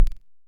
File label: kicks, Bass Drum